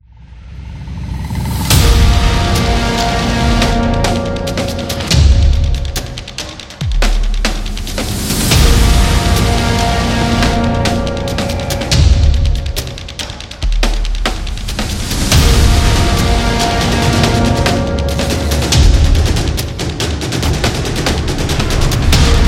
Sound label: Countdown Trailer, war drums